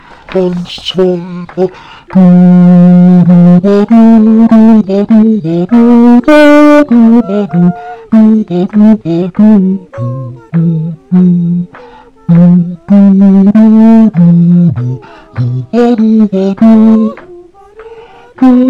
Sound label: BASS Swinging